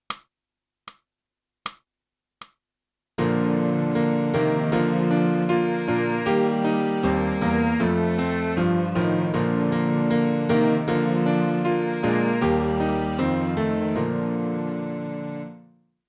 clarinet; boosey method

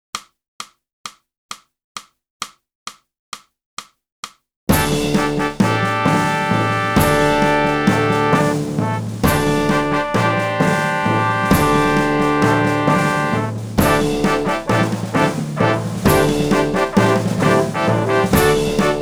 sax

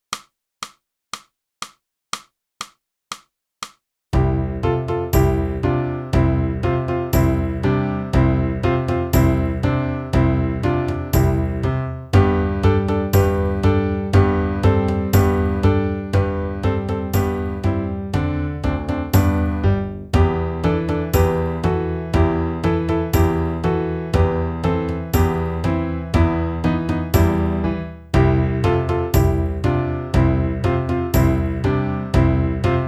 flute; boosey

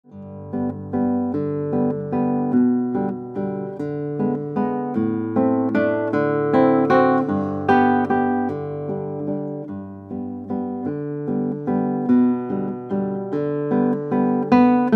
Guitar

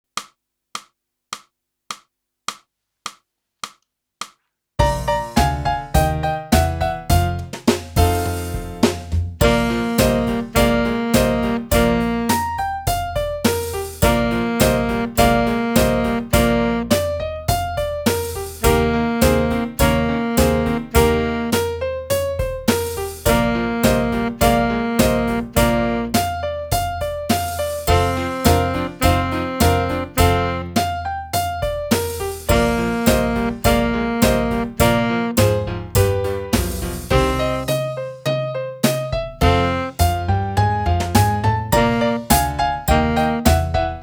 sax

